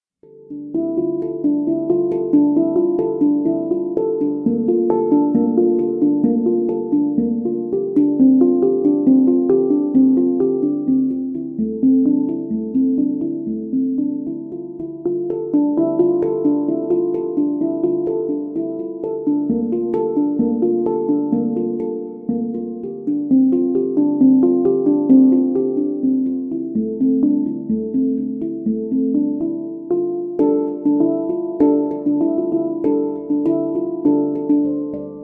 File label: Handpan Version